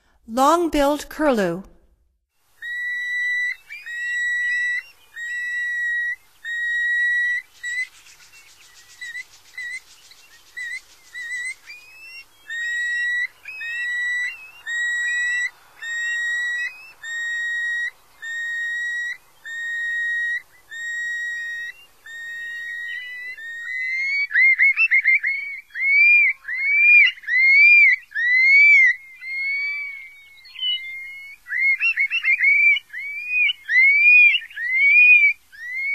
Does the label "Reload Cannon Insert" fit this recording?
No